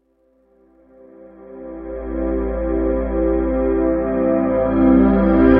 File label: Plain Pad